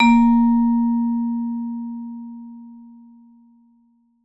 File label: vibe med ff A#2, Marimba & Vibes; Sampleheads - Dave Samuels Marimba & Vibes